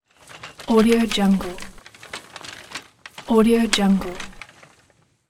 wheel cart

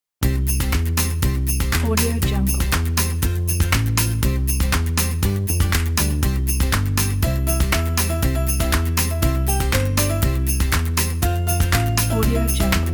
jingle bells happy christmas

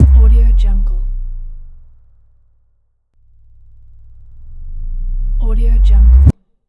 sub drop down; soundcreator

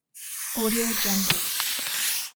drawing line across paper with marker

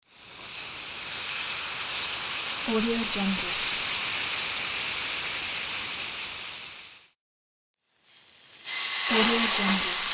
acid burn; warpjumper

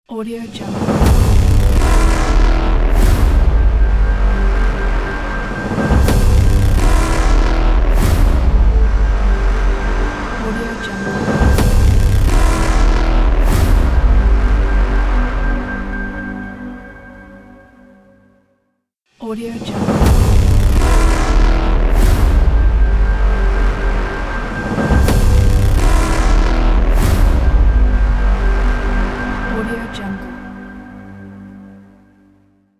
glitch logo

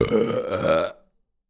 male burp

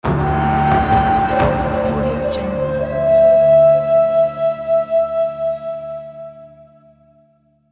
chinese logo; absinthemedia

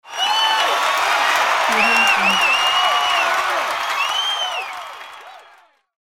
crowd cheering

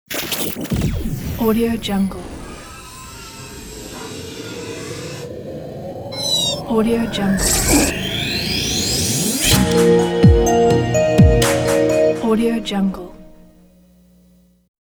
radio jingle ident

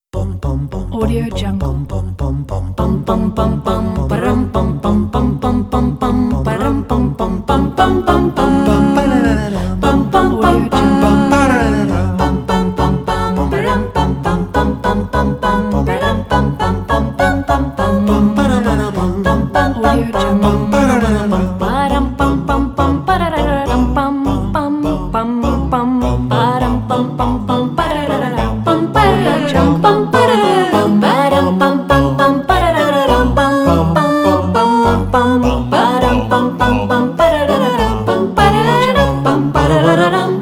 dance of the little swans vocal quintet

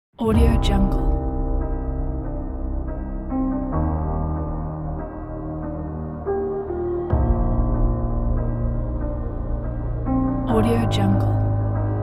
epic cinematic trailer; hardprosound

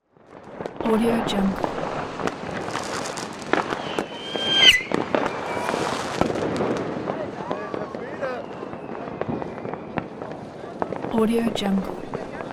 fireworks

